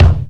prominent bass drum e key, kick drum tuned to the E note. Loudest frequency: 325Hz; kick drum samples